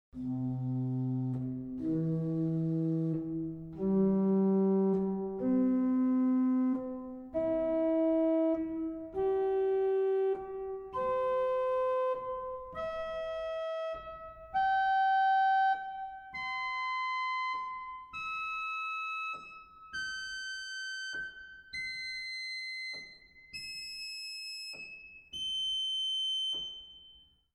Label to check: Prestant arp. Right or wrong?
Right